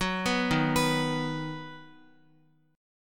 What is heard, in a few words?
Eb Minor Sharp 5th, Guitar, Ebm#5 chord; arpeggiated; JGuitar